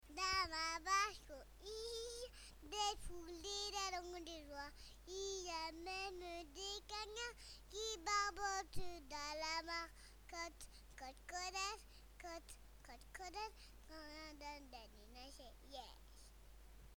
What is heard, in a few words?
rock n roll